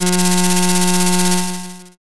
slot spin

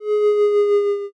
boo

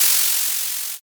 tire skid

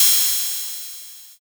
cymbal

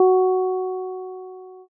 piano

